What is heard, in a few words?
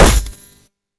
Bullet hit tire; Hovl Studio; SFX Battle sounds; WhiteMan Unity2D